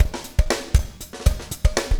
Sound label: breakout 120, 120bpm, loops